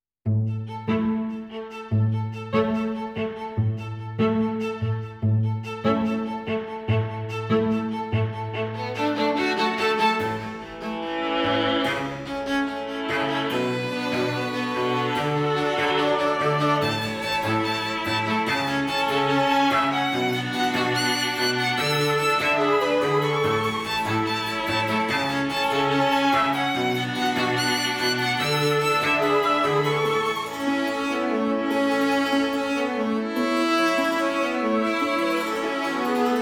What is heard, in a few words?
String Quartet